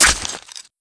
combat knife hit flesh; tf2

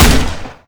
sticky roller shoot; tf2

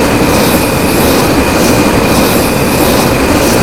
fire hose loop; tf2

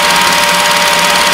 heli gun spin; tf2

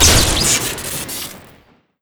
tesla needle fire crit; tf2

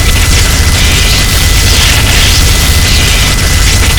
chaingun shoot crit; tf2

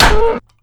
axe hit world; weapons; tf2

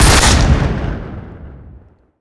shoot, weapons; tf2; frontier justice

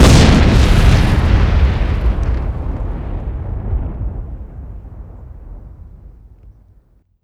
dynamite bundle explode; tf2

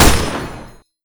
gold machine gun shoot; tf2

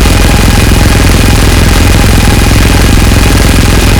snail gun shoot; tf2

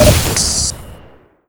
heal grenade explode; tf2

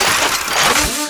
draw nailgun heavy; tf2

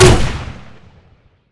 baseball gun shoot; tf2

